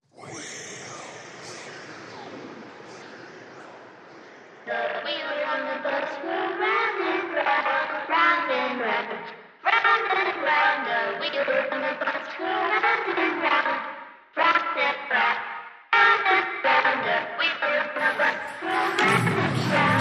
Wheels On The Bus Horror, Vocals Only

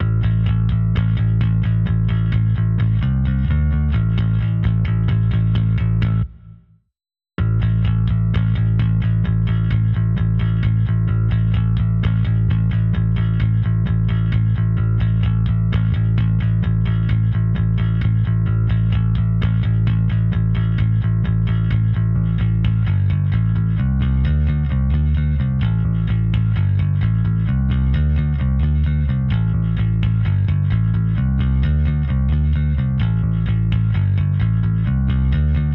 Bass

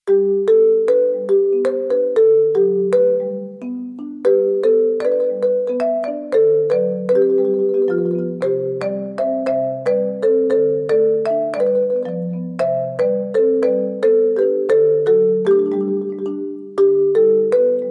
Solo Marimba

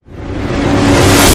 Echo In Reverse

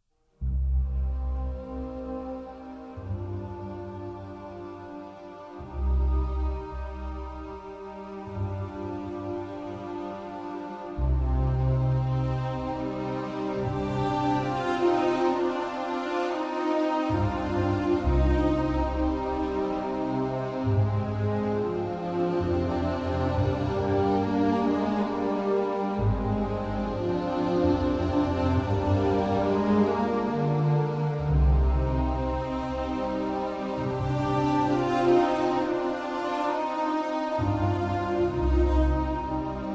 Only Strings

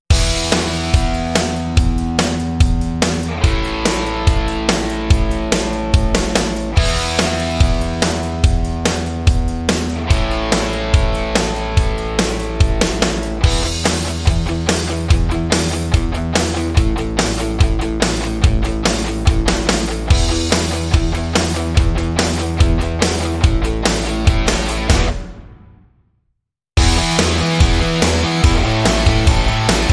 Enjoying Life, No Lead Guitar or Synth

